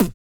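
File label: vox percussion, Vocal Planet Jazz FX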